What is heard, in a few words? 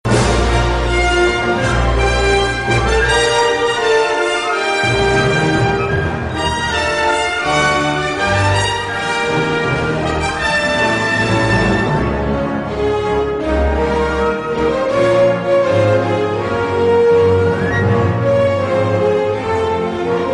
Theme Ringtone